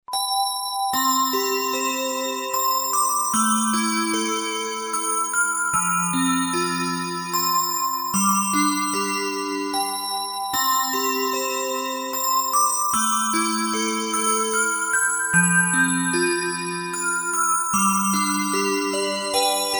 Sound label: MUSIC BOX